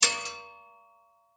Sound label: Bullet Shell; Bullet Shell 45 Drop Metal Catwalk; Drops (Sound Effects:Objects); Blastwave; Warfare